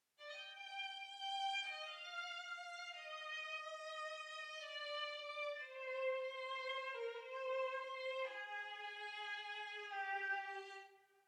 182 BPM G Min Legato Strings; Loop Stems; Cymatics; Dom Perignon

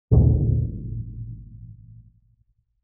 Timpani Hit

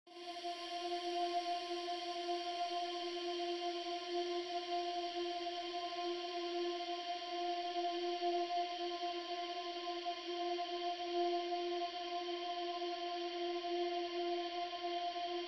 124 BPM A# Min Ambience; Loop Stems; Cymatics; Reasons; Breakdown Loops

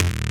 Neurotic Grid Fragment F 145 BPM, Psytrance; Grid Loops

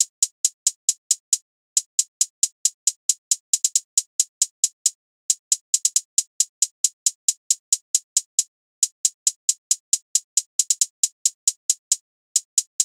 Hihat Loop 136 BPM

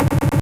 Grid Fragment F# 142 BPM, psytrance; Grid Loops; Fanatic

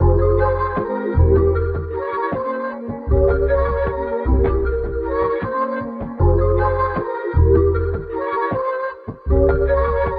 Warped, 155 BPM F Min, Melody

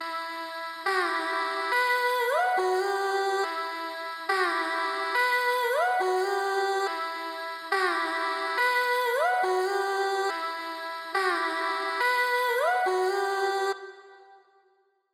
140 BPM E Min Vocals; Cymatics; 2022 Melody Collection; Drill; Loaded

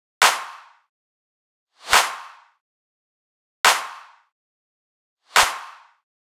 Riddim Drum Loop 140 Clap; Ghosthack; Riddim Essentials Volume 2; Full Drum Loops

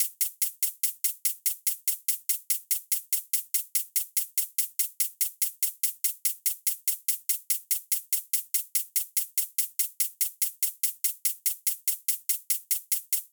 Drum Loop, 144 BPM Hihat, Future Bass; Drum Loops; Cymatics; GHOST (Beta Pack); Brisket Drum Loop

